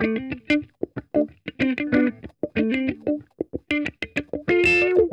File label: ROLLER, Funk Guitar; Zero G - Funk Guitar